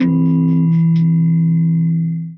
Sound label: outro; honeyphrine